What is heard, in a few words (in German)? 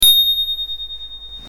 Fahrradklingel Feuerwehrmann